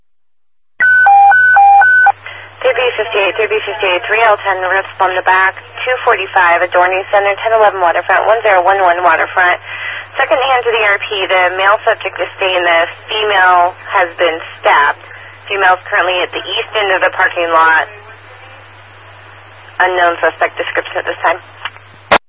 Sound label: stabbing